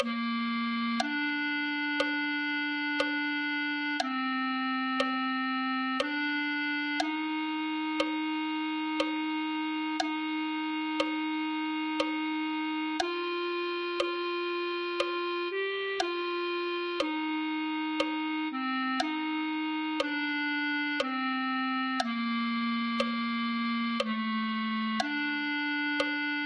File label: clarinet